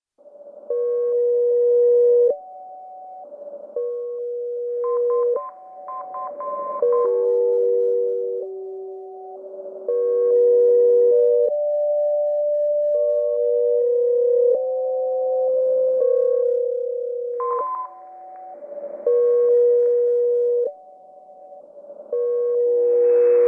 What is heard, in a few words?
Short Waves Long Distance; Oracle; Wave Farm